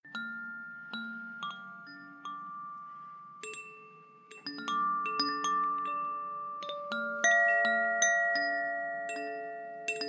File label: wind chimes aqua sounds; koshi